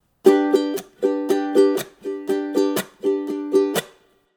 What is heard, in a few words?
duxudu, ukulele